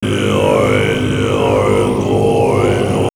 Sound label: Monks, vox